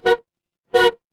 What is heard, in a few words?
mechanical horn